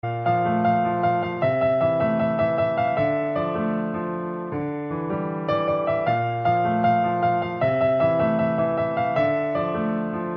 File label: love music tone; samsung ringtones